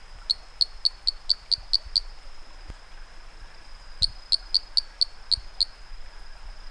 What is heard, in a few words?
Vitreorana parvula; herpetologia; cantos